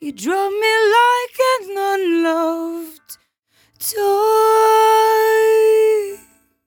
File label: 57bpm Am, Velvet Vocal; Zero-G